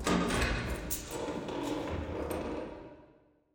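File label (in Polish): otwieranie kluczem; apocalipsis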